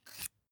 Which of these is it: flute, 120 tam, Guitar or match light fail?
match light fail